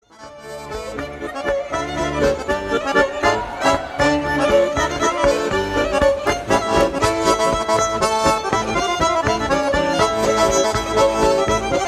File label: Bourree; tradethik productions